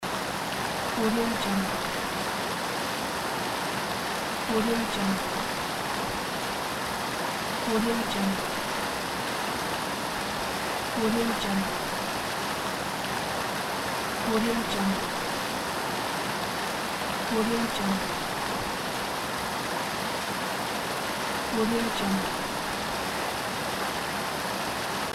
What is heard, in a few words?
small waterfall